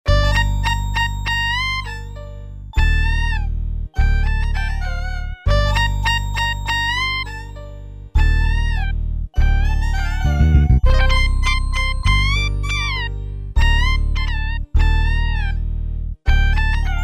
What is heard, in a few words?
gw8 kitler; fl9 ritim convert